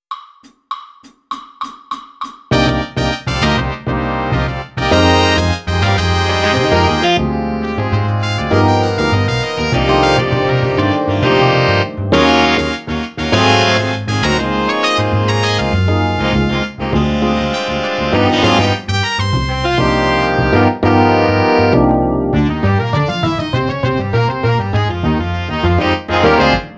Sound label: Drum Kit Samba Play a Long, Jazz; All-State